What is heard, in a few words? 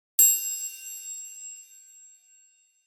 triangle